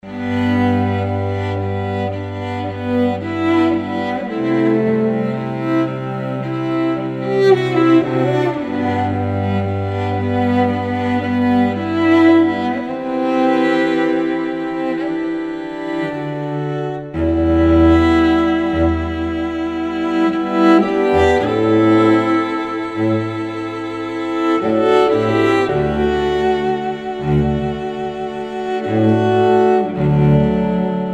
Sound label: Curious Cello Ensemble